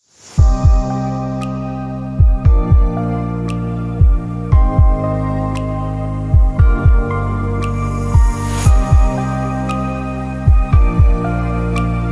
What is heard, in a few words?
Cinematic Atmosphere Future; atmosphere music